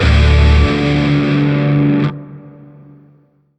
Shawzin Yo Chord E; Music; Warframe; Grineer; Chords

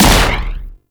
Arch Railgun 2D Shot; Warframe; Archwing

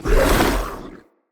Attack, Vocals; Enemy; Corrupted Sproutfolk

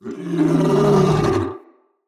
Battle Dog Yawn; Animals; Vocals; Snc Battle Dog

